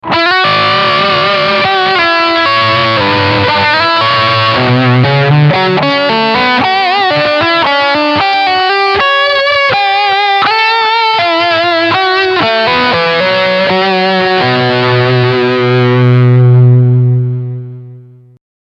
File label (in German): Leadsound Steg HB